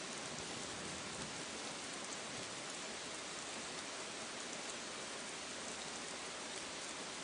Rain; fishing

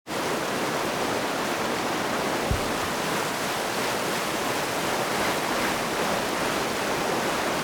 water storage

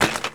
Land Dirt

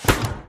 fridge

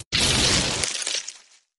trees down

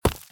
stone small

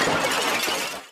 tiles

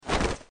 Cloth Whoosh

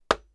wood light

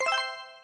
match success; fishingduel

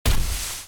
drop into snow; MountainVilla

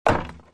wooden Stairs

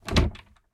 door close

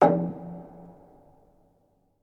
metal impact

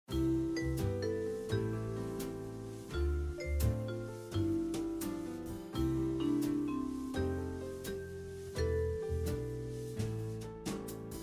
BGM; Venice

